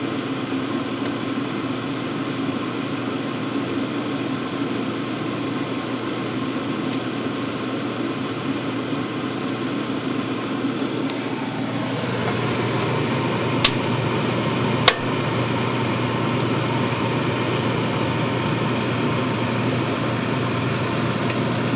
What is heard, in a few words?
sound freezer